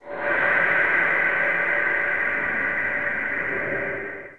stinger; skinwalker goatman; slender skinwalker

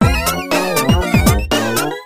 failure; tf2ware ultimate; music game; ds touched mona

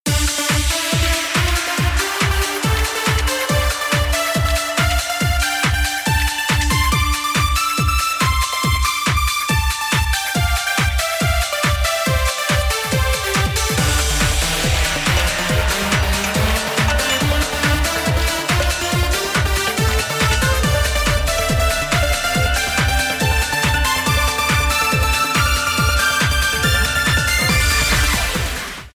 typing, music bossgame; tf2ware ultimate

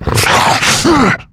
death, male zombie; nmrih; zerosiki; slender

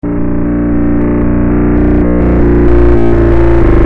alert theme; phonophobia; zerosiki; slender

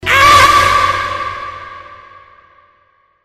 aughh; freak fortress 2

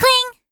puing

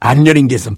pain sharp

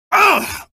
hurt; zombiesurvival; medieval raid; arkantos

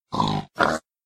backstabbed pig; freak fortress 2

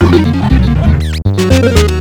failure; tf2ware ultimate; music game; wii 9volt

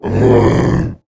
attack, male zombie; nmrih; zerosiki; slender

